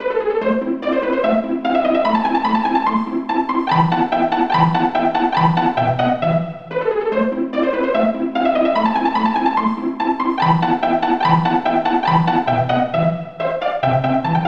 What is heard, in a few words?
pizzicato section